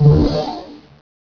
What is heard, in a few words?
TAKEOFF